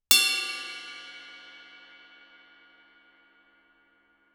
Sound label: crash stick bell ff stereo, Suspended Cymbals; Percussion